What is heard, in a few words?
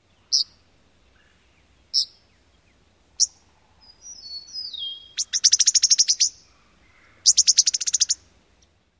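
Eastern Meadowlark zeet and chatter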